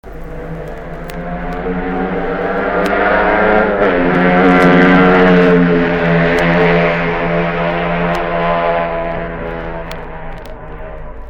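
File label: Honda 125 Twin, motorcycle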